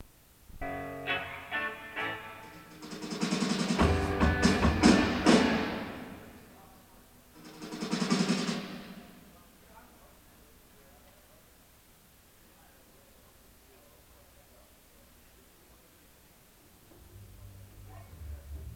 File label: soundcheck tuning; Veterans Memorial Auditorium, Columbus